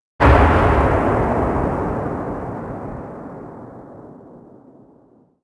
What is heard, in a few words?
explosion mid tail; World of Tanks Blitz